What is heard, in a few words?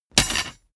drop metal bar small